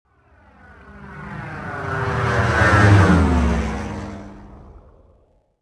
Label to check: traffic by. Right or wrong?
Right